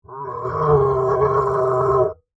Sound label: Monster Groan, Horror, Monster, Groan, Creature